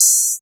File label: light open hat one shot A# key